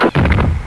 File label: FOOTSTP; PC Gamer IT CD 38; Requiem